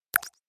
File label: Pop up bubble notification